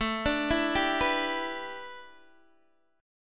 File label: A 7th Suspended 2nd Suspended 4th A Guitar DADGAD, chord